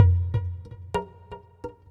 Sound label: PERC LOOP ETHNO 125; eJay